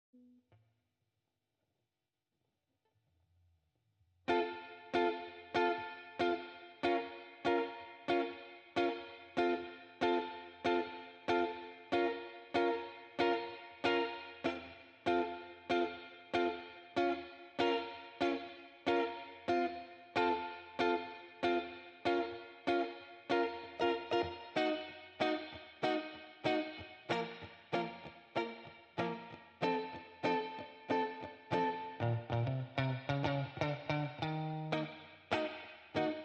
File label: Guitar Clean